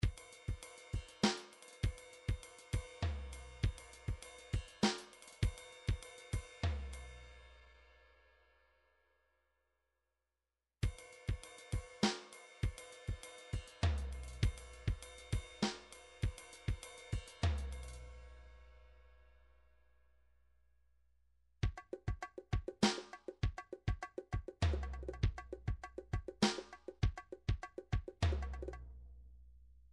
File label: midi drums; Bruford Midi Drums